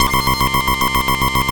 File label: deck alarm LFO; Bass; Effects; Ableton; Creative Extensions